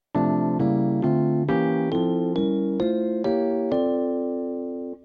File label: scale, piano